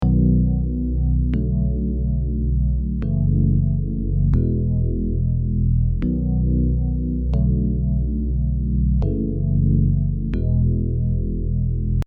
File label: rhodes